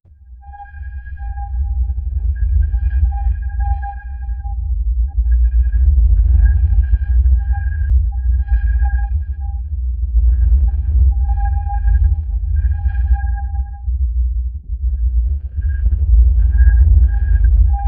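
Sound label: sound for an ocean environment, submarine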